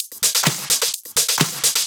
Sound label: Houseloops 128BPM; Vengeance Samples